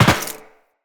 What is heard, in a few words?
Land Metal Perforated, Footsteps; Characters; Tenno